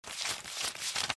audio pay; coffee shop

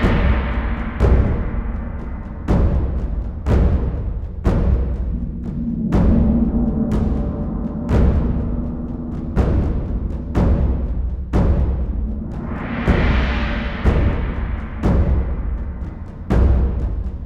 Hide Perc Loop; Grifo; Toren

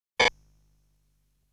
Barrier shot sound; legend of hero tonma

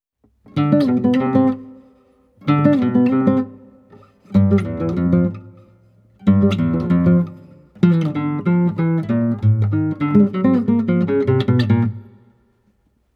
Underground Background Music, guitar; Super Mario Bros.; super mario series for guitar 2010

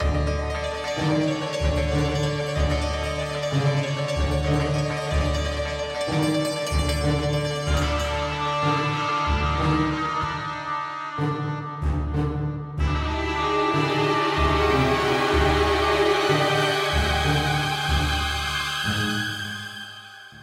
Puzzle Loop; The Vale; prince of persia 2008 gamerip

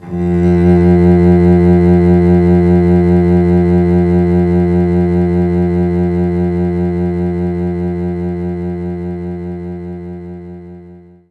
Cello F1; knights try

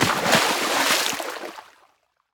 splash; Scrat

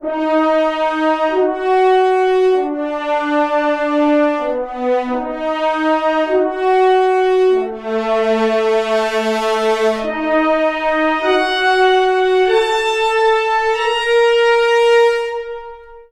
String; The Bureau XCOM Declassified

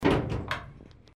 Accordion door closing; Robobeat

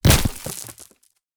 Cactus Break

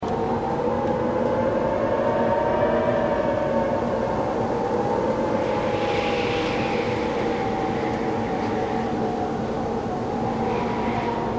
Mountain Window Wind Tunnel Loop; Journey

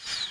Space Restore Down

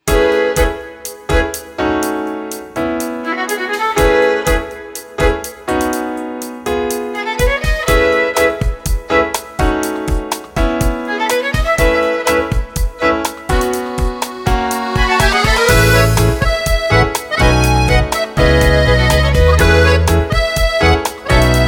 clock Lock montage

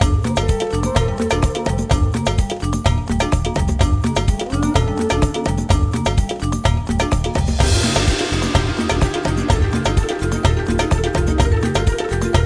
Final Boss; Donald Duck Goin' Quackers; dreamcast; Merlock, Phase 2

